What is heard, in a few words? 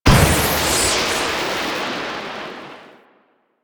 Lightning Machine Strike; Ambience; Props; Warframe; Entrati